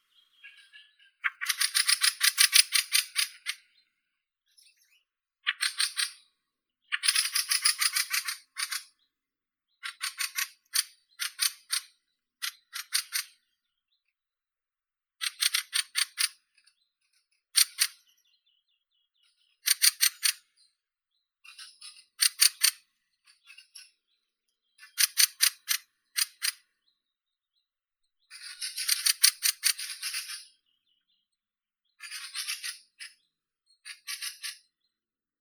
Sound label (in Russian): drozd ryabinnik turdus pilaris